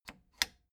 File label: plastic button latch loose click disable slow